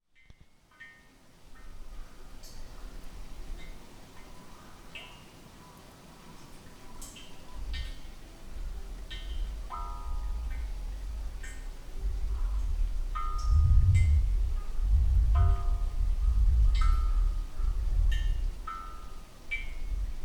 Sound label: Suikinkutsu